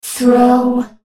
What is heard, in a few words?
ultimate effort; Oriana